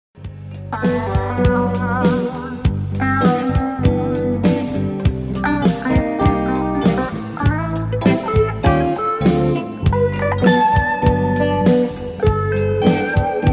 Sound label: solo